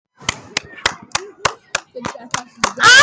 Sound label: clapping